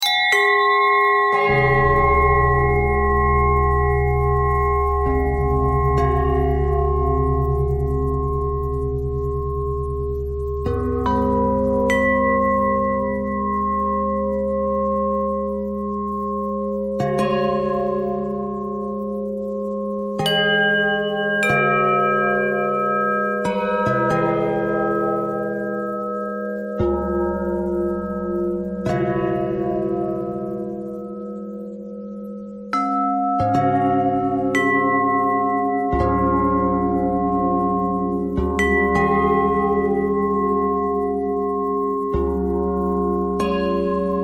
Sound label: bell bell thread pentatonic, bells